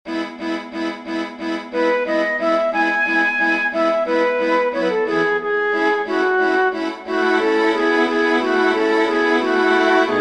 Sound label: lied ohne worte for flute strings; heller stephen